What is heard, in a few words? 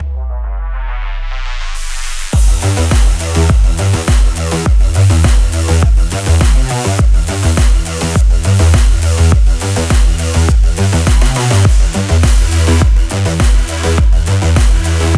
action sport